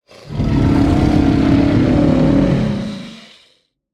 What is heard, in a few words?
crocodile roar; soundscrate